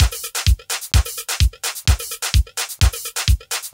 House Beat, 128 BPM

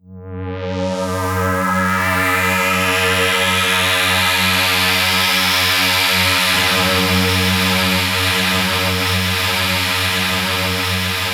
Trance Sweeps; FX; Vengeance Samples

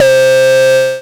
Synths C; Vengeance Samples

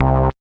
Synth Bass; Vengeance Samples; VEE Synth Shots

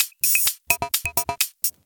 Electro Loop 128 BPM; Vengeance Samples

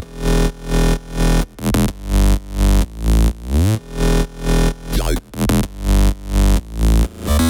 128BPM Bass Root E; Zoo; Vengeance Samples; Zoo Kit

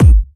Hard Kicks; Vengeance Samples

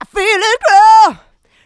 voc, female vox, Vocal House

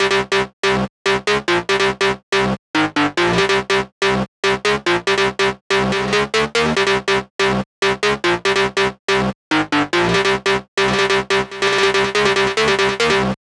Special Sounds Root C 142 BPM; vengeance essential club sounds-3

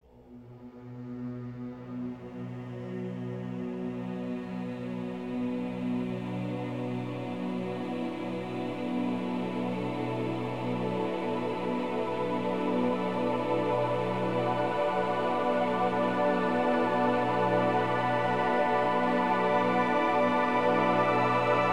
140BPM Symphonique B, Melody Fills; 24Bars; Vengeance Samples

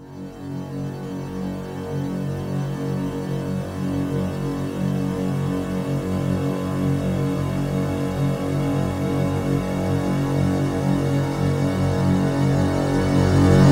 140BPM Trance Athmo F#, Melody Fills; 8Bars; Vengeance Samples

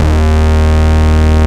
Bass Cuts; Vengeance Samples; VES1 Instruments

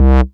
Bass Multi Rev C; Vengeance Samples

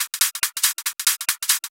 Loop 140 BPM; Vengeance Samples

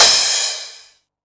Cymbals crash; Vengeance Samples

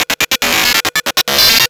Fill 140 BPM

